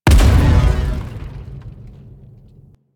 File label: Stomp Impact B; Warframe; Corpus; Jackal Redux; Abilities